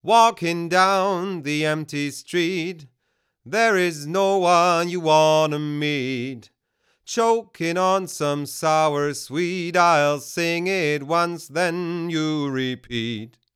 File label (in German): Male Vocal ohne EQ; Millennia NSEQ HF